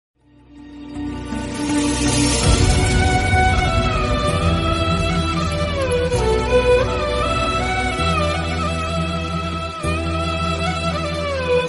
emotional bgm, telugu movie sad bgm; attarintiki daredi movie; pspk ringtone adda

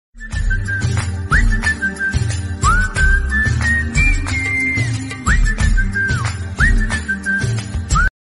love ringtone bgm love music ringtone; surya

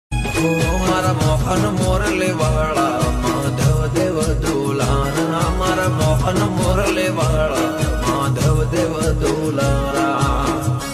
Gujarati call ringtone; jay dwarkadhish; ગજરત રગટન

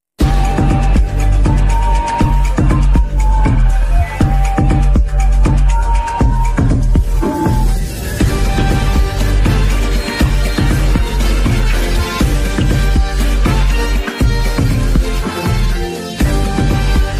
bgm ringtone; metro; thalapathy vijay

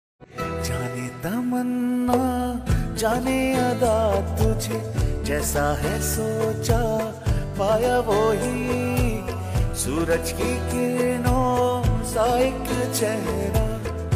pakistani drama ringtones music; ishq mushid drama

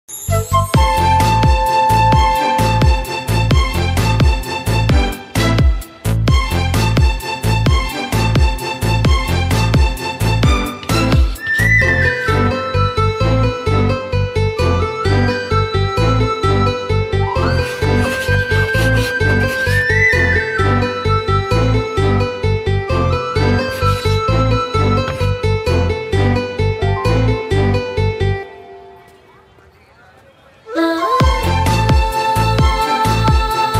telugu movie bgm tune ringtone; boy movie; telugu movie status; south

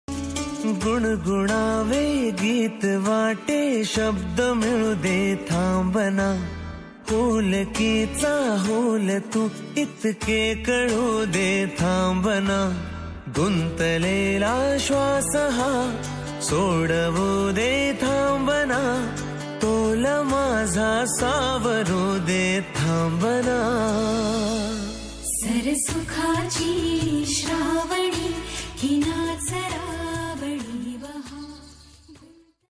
marathi love song

